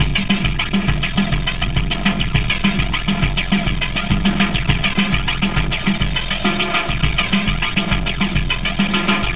Sky Lab Beat, Processed Drumkits

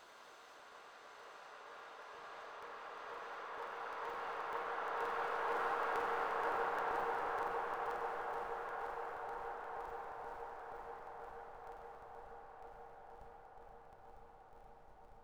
long reverb; Polyend; Loops n trouble

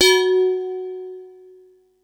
mallets

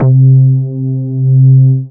BAS MINI, Mini-Moog

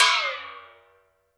BOX FX; Univers Sons - Raricussions

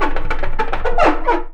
SCRUB, HOUSEHOLD; AKAI Anime Sound FX

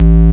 sub A, techno trance bass

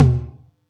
Yamaha EX-5

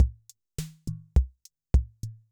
mambo, Yamaha MR-10

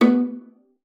B3, VIOLA FINGER; Xsample VOL-5

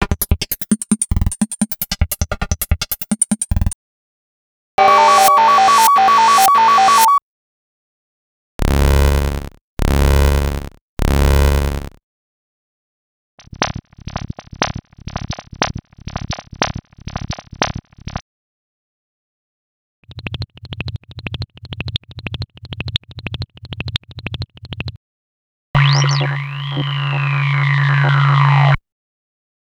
FX Machines; X-Static Goldmine 5

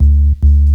Bass, Jungle; Times Bass; M8

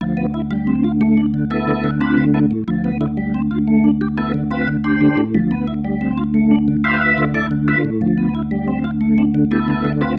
Pattern, Organ

